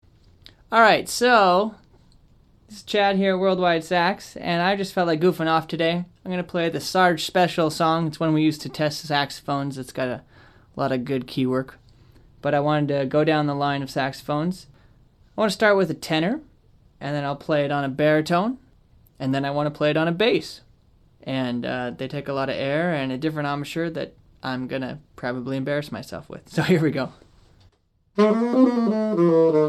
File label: bass sax